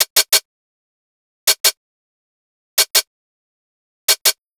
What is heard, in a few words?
DRMSND, Pure Electro, BEATKIT; USB Soundscan vol.03; AKAI